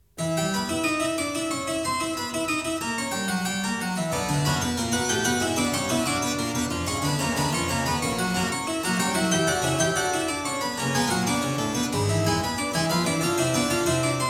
Fugue in E minor, clavier; Prelude & Fugue No.10; BWV 855; BACH; WELL TEMPERED CLAVIER; BOOK I

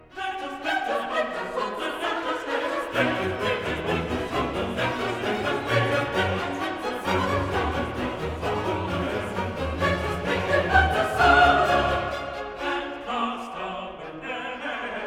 Chorus; Let us break their Bonds asunder; Handel; Messiah

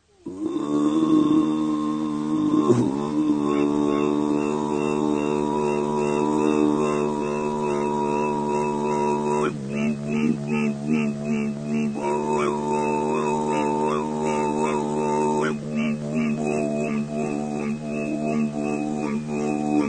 Tuva Voices

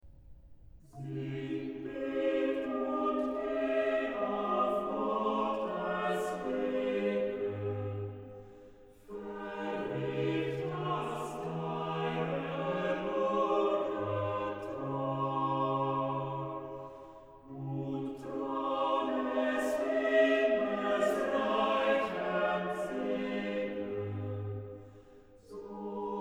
Chorale, ORGAN; BWV0093; Sing, bet und geh auf Gottes Wegen; BACH; CHORALE PRELUDES

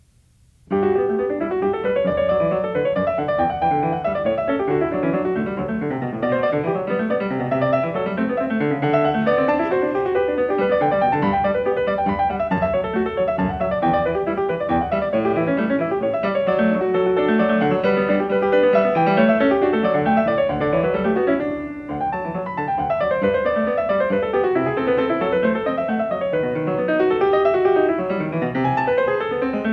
Variation 1 a 1 Clav., PIANISTS; BACH; GOLDBERG VARIATIONS

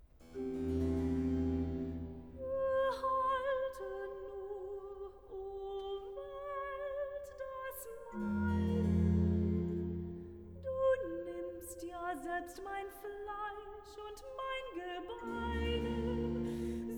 recitativo, soprano; vocal; BWV008; Liebster Gott, wenn werd ich sterben; Behalte nur, o Welt, das Meine!; BACH

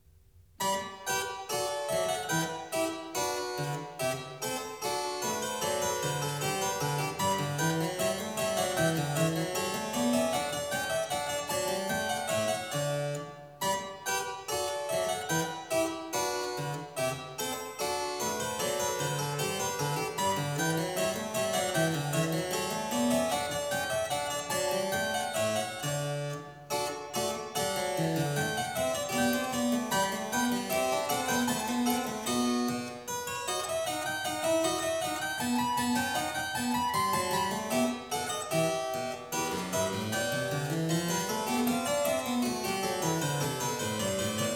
G major, Gavotte; French Suite No.5; BWV 816; BACH; CLAVIER WORKS; FRENCH SUITES

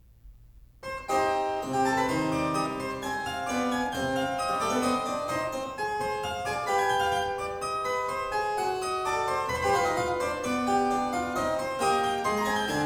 C minor, Courante; Partita No.2; BWV 826; BACH; CLAVIER WORKS; PARTITAS

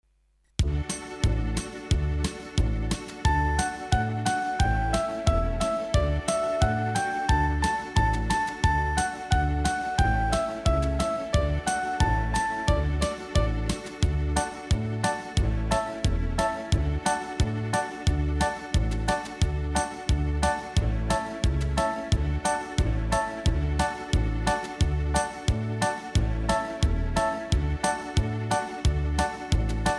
Instrumental, karaoke, Bulgarian; Bulgarian Folk Songs; Children

